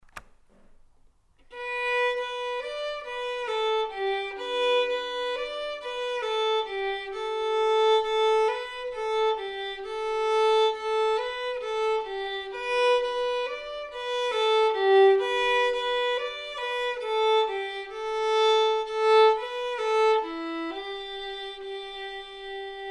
Manx lullaby, fiddle; Arrane Ny Niee

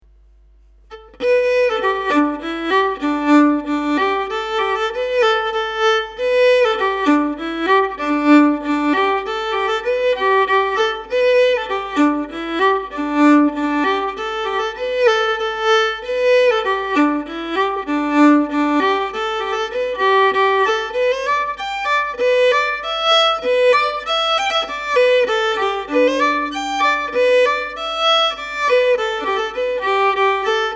lehanes solo fiddle

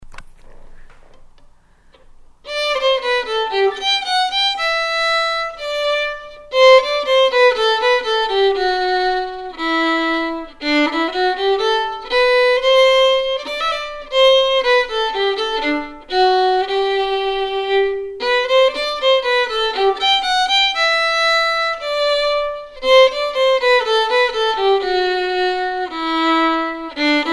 Hornpipe, fiddle; Tom Tully's Hornpipe

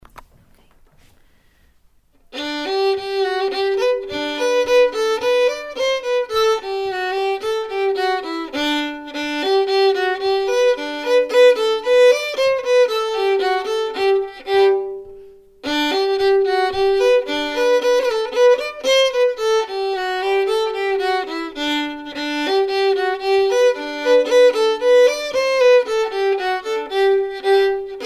Mazurka, fiddle; Mazurka de Barbary

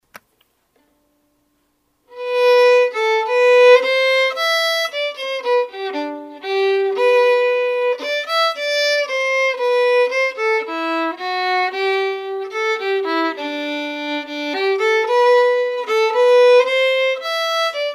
waltz, fiddle

